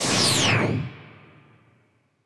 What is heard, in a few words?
Spaceship FX; Video Game; Cymatics; Video Game Sample Pack Vol 1